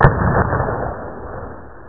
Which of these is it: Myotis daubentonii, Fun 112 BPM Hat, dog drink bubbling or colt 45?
colt 45